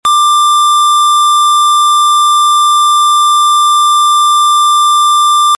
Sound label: tone